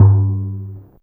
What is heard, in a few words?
low floor tom drum one shot g key; tom drum samples